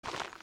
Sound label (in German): samen pflanzen; Stardew Valley